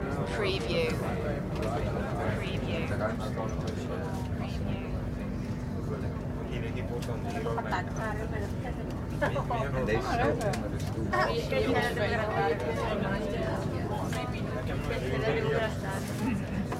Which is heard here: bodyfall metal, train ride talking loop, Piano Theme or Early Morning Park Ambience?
train ride talking loop